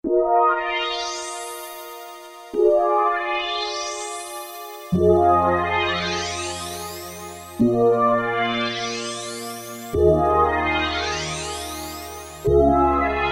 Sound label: Planet, Roland JX-3P